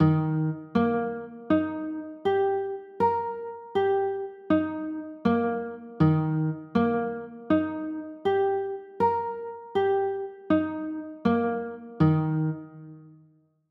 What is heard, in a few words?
e flat maj, chords